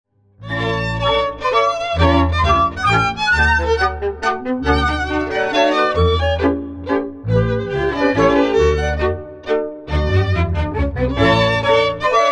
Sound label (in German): Marsch